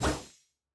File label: throw ball cold; CrossCode; battle